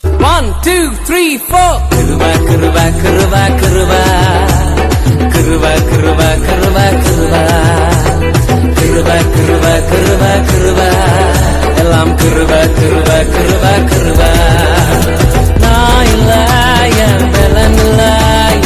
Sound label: funny ringtone